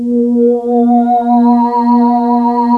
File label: PPG WAVE, OLD SYNTHS